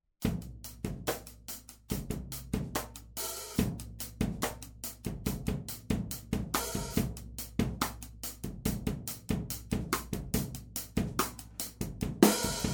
Overheads

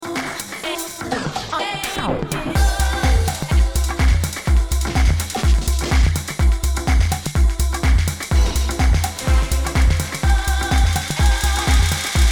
DJM-900NXS2 Dub Echo

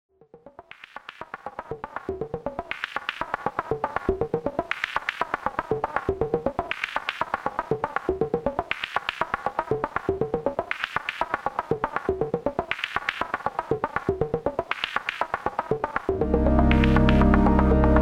Serge Crocodile Multitrack

